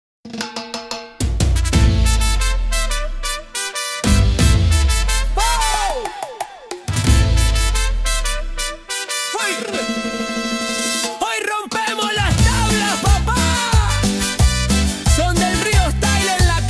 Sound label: hacemos ruido